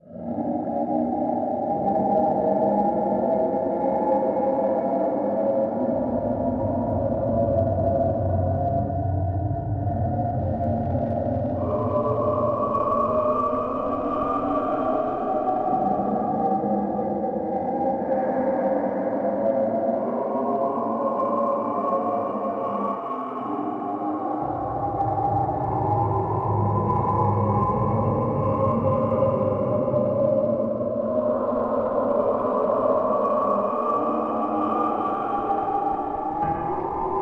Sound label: Ambience